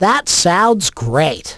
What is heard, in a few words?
Correct